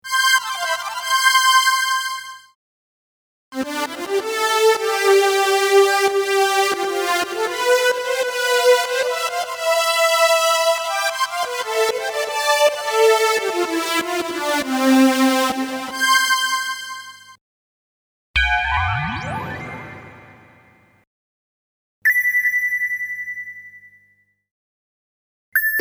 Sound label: Korg Kaossilator